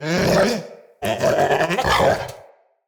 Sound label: Battle Dog Thankful; Animals; Vocals; Snc Battle Dog